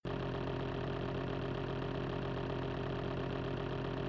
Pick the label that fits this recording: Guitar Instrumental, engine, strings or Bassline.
engine